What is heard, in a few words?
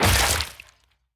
sever tail